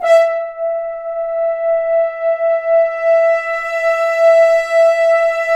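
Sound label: BRS FHRN FZ, French Horns sfz; Roland L-CDX-03 Disk 2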